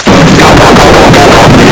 piep pup loudest

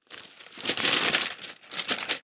newspaper; talking ben

